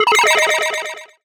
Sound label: Sci-Fi Lasers - FX Sweeps; Antidote Advent